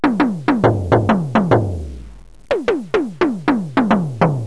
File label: Elec TOM